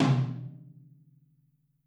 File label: Drums Percussion Kit1 Room Mic